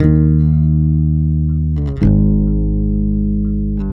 REAL BASS, BASSLICKS; Ueberschall; Jam Box

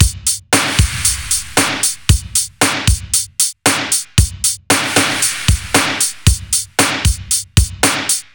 Beat Heavy Clapper; Zero-G; Future Wave; Beats

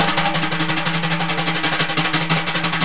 TIMB BR HI, Synclavier Percussion; Synclavier Percussion 2; World & Orchestral; ILIO

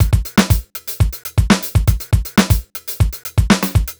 120 basic, Nu Jazz House Beats; PowerFX

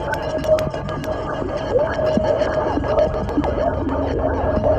Water Scrape; Sonic Foundry (Sony Creative Software); Ma Ja Le Ethereal Textures

